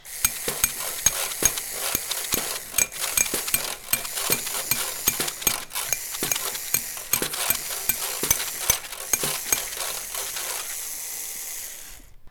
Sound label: Fabrica Foley